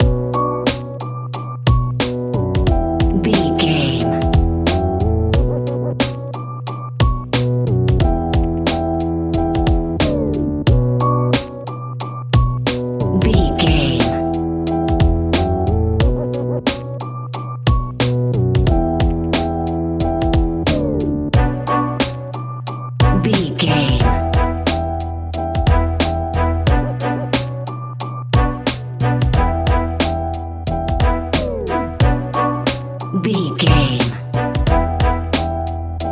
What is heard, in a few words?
west coast hip hop; West Coast Urban 60 Sec; Catch 22 Music Network